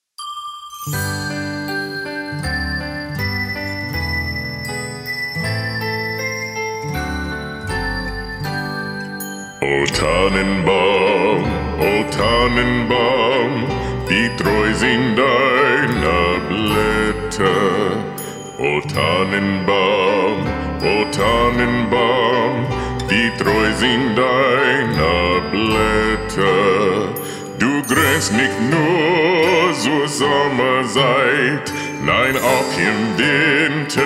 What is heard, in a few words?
Singing